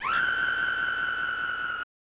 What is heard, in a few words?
Scream of Terror; Clock Tower The First Fear